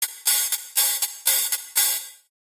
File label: hihat